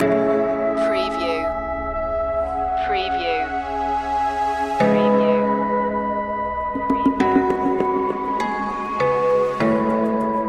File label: ocean flute loop